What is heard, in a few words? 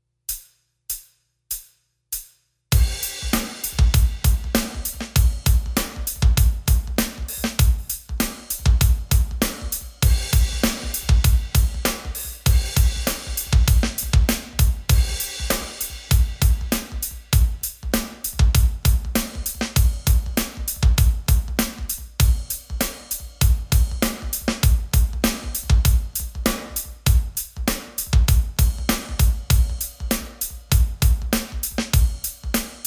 Drums 108bpm